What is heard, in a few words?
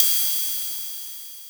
ride; kogamusic